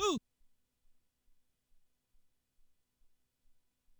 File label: Oooh, ELKA X1000